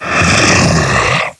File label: Scrake Attack